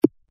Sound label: Golf Hit Ball